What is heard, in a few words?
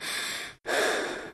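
Realistic Fatigue Voice, female; oblivion; Imperial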